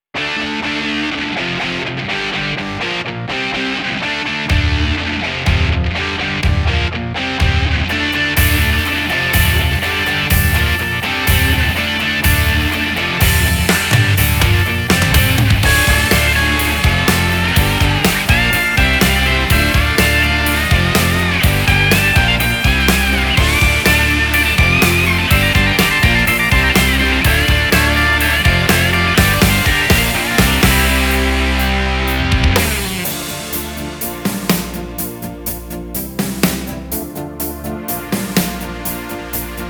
Hurricane Rock

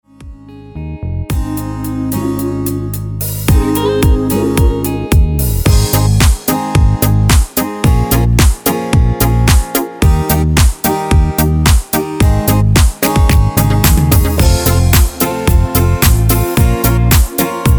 Classic Beat; TYROS; T1 STYLE

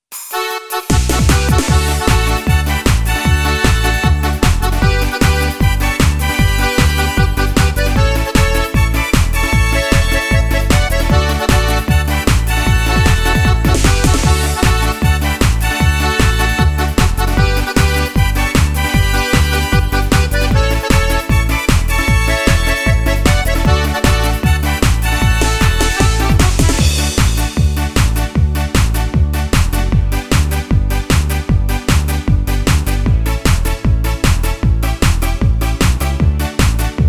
Dance

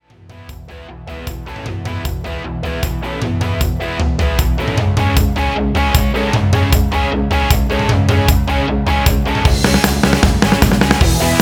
Fire Rock

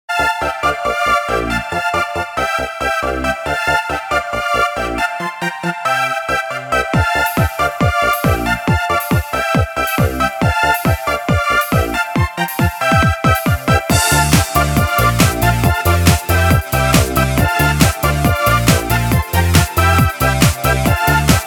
Dance

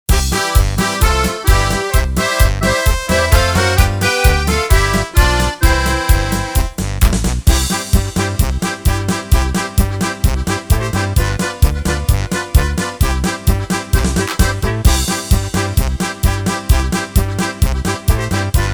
Oberkrainer Polka

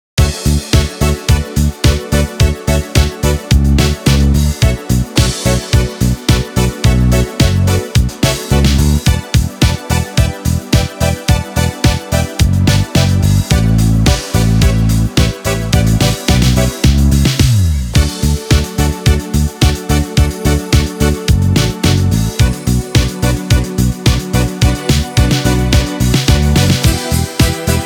Pop

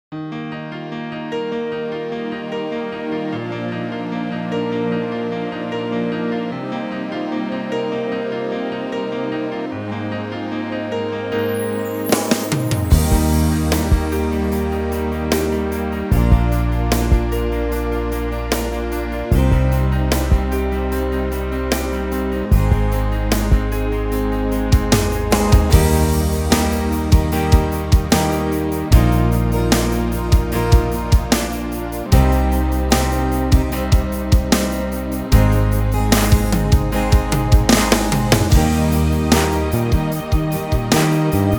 Piano Ballad

